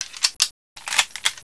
reload, paintball; paintballav

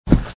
step soft; openspace3d; fps navigation

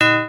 bell; openspace3d; kinectdemo

